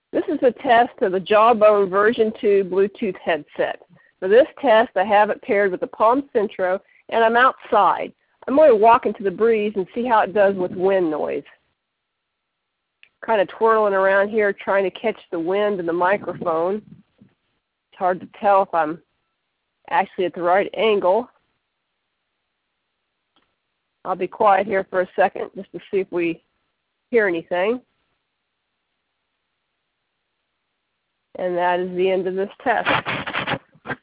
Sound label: outside; jawbone2